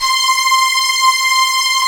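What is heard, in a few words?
strings, Brite; String Master Series; Vlns 2-5; Vls3 Brt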